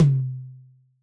Yamaha DJX 2B, Jazz Tom

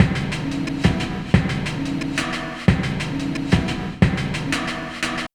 Trip Loop, Drums & Percussion, Electronic; Sonic Foundry (Sony Creative Software) - Electronic Point Blank

